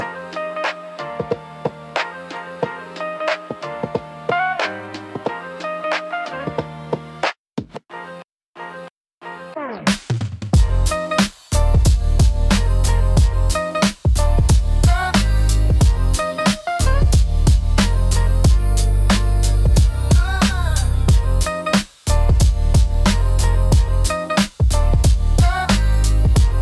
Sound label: uplifting lo fi glitchy sfx low fidelity; for fitness